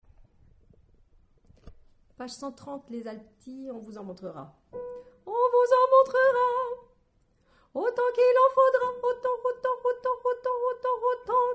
Alto

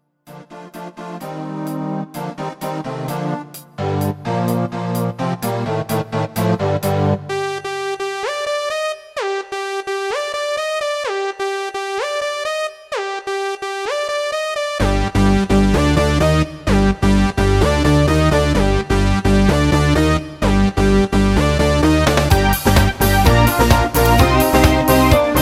Tango, midi sequences

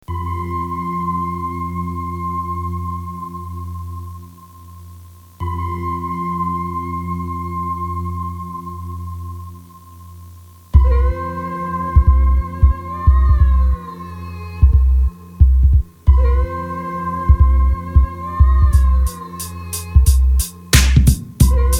Break Beat

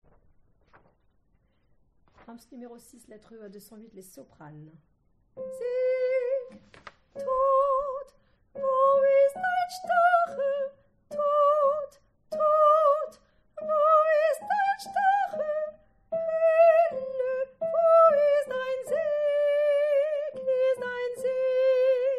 Soprano

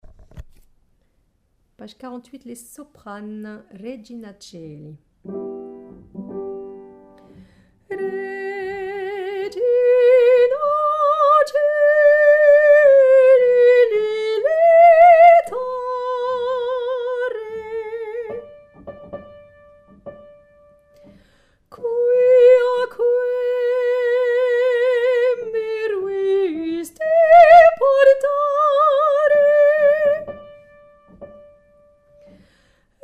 Soprano

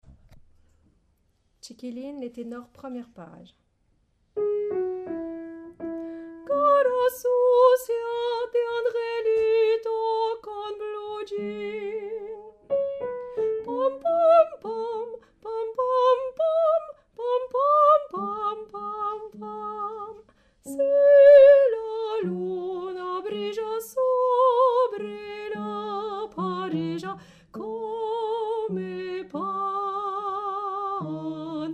Tenor

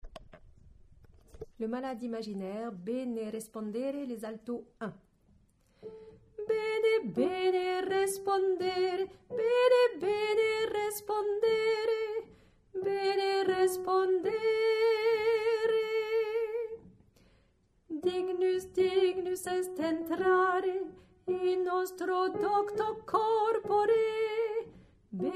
Alto; Le malade imaginaire; Molière; Bene respondere

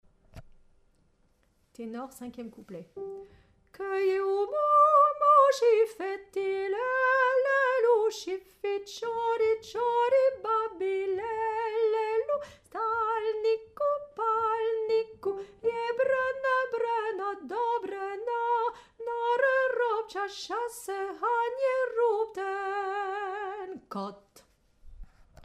Tenor

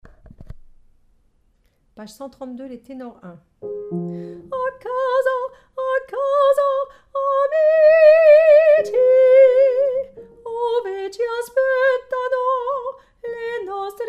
Tenor; acasa

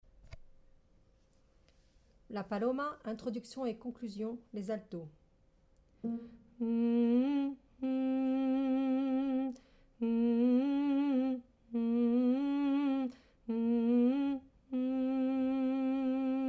paloma Alto

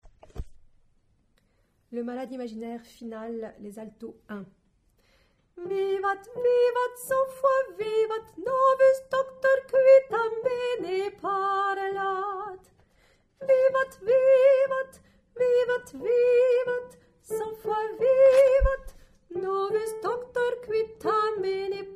Alto; Le malade imaginaire

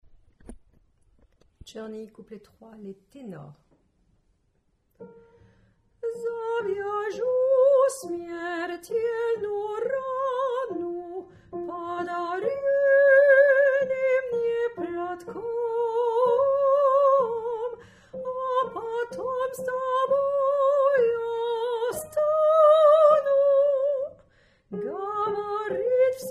Tenor

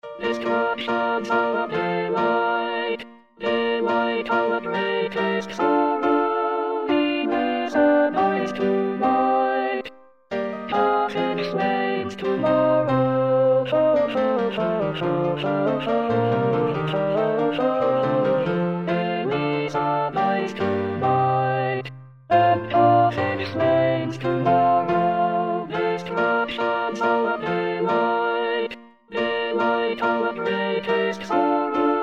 destructions our delight, Alto

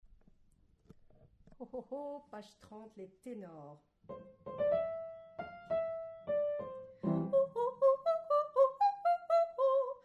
Tenor

